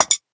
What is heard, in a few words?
click shop refresh